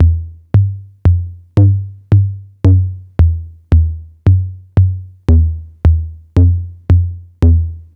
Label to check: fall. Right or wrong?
Wrong